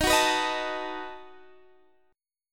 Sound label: B 7th Eb